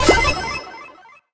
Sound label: Jingle Extra Life; Bionic Commando Rearmed